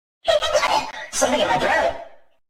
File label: giggle something in my throat